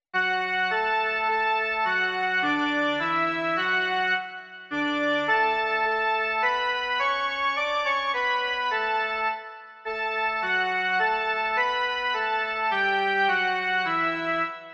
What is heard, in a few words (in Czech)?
jen melodie; Živ dlouho ten